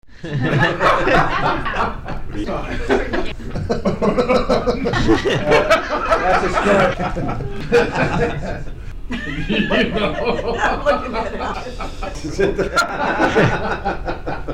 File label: laughs